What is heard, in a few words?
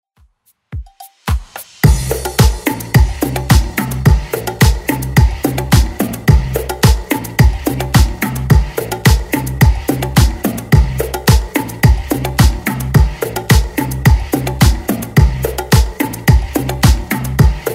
70s Dance 104 bpm; Redrum